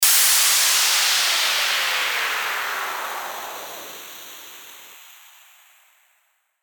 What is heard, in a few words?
WHOOSH